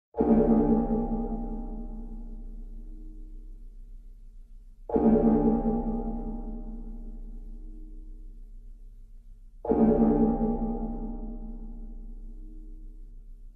Cloister Bell

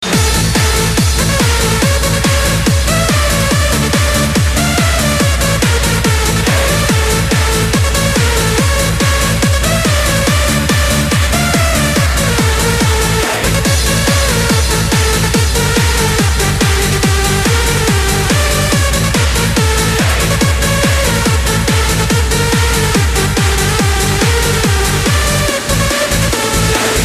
Jewish Dance

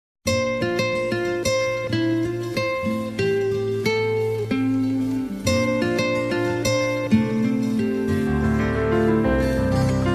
Very Cool Guitar

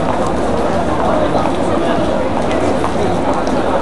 Busy City Ambient

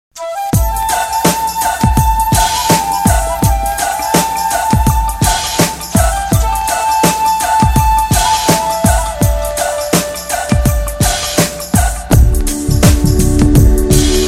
Awesome Flute

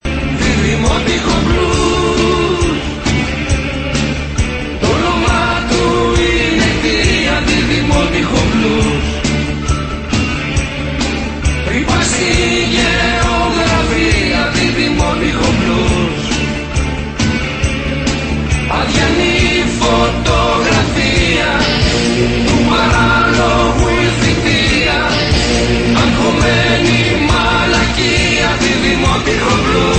blues

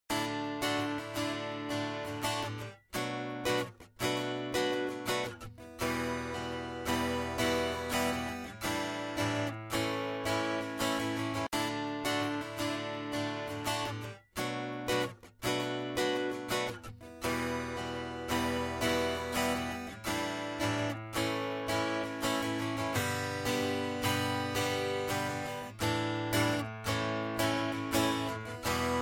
Digital Guitar

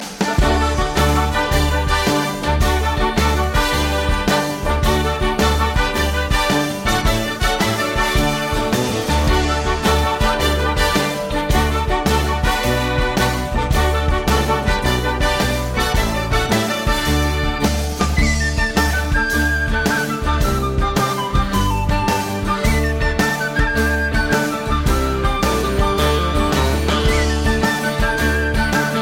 Boogie; Glasgow

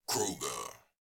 Beat Tag

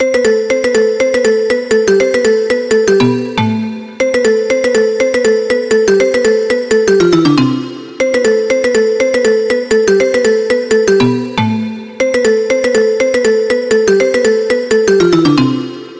High Pitch Rhythm

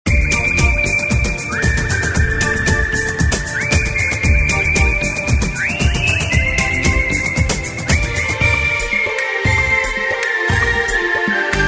Ringtone

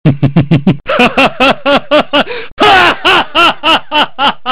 Big laugh

